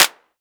clap sound tuned to the A# note. Loudest frequency: 5204Hz; single hits one shots